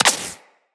Laser Impact Flesh; Weapons; Bullet Impacts

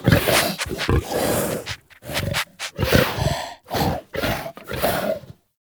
Truffle Sniff; Warframe; Infested; Juggernaut; Truffle Hunt

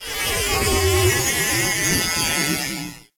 Landscape Textures, Ambience; Venus Open World; Objective Rooms

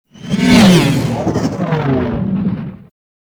Fighter Ship Close Short Fly By; Warframe; RailJack; Corpus; Space Fighter Crp Ship Engine A

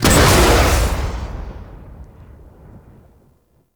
Rocket Launcher Fire; UT Weapons

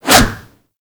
Staff Swing; Warframe

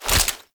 Sniper Skin Reload End; Warframe; Weapons; Venkra Sniper Skin

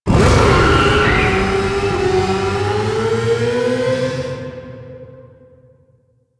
Enter Stinger; Rusalka; Convoy; Warframe; NineteenNinetyNine; Stage8ShitboxConvoy

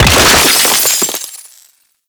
Column Destruction; Gameplay; Sentient; Warframe; Tau; Destructible Dynamic

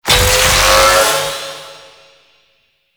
Necramech Melee Charge; Warframe

